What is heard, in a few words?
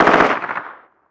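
gun mp5 distant; Call of Duty Modern Warfare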